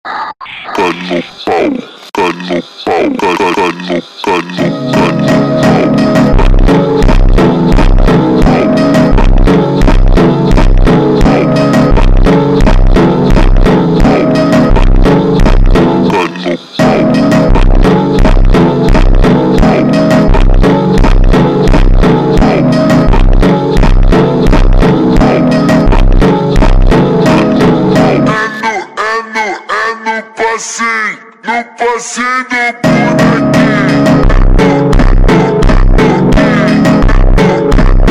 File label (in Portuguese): Baixo Teclas ultra Slowed